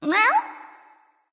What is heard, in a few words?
confused; smite; awilix